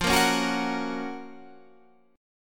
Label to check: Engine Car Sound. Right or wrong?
Wrong